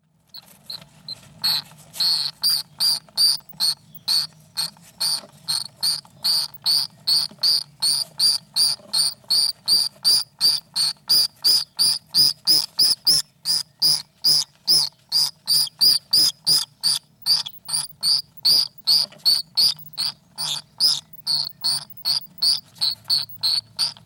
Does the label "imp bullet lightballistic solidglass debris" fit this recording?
No